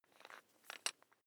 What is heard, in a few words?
electric drill bit fasten wrench turn medium